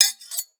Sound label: Sword Swipe; Weapons; 1001 Sound Effects